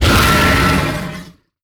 boss Hit Heavy; netent

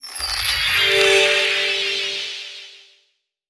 flowers open; Stikky; netent

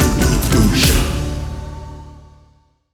Big Win End; netent

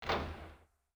reel Bounce

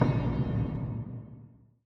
reel Bounce; netent

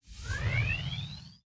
train departs; netent

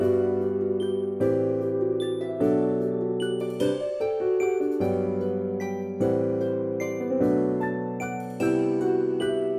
Ambi Free Fall; netent

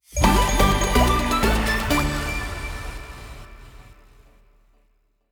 win Large Legacy; netent

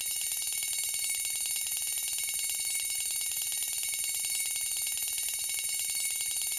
count Up; netent

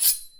General Button; netent

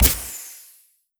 spin Button; netent

